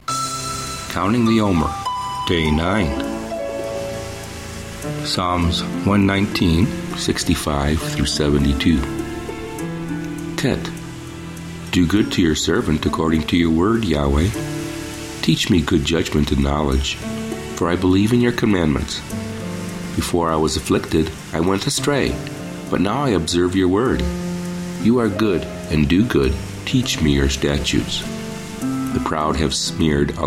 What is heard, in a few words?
Meditative w Waves Ocean; Omer; Psa119